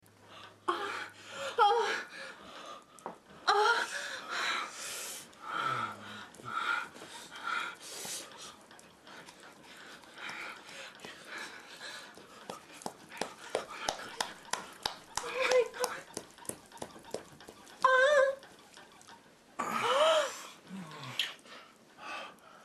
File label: moan